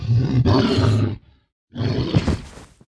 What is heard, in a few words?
bug shark trap death, monster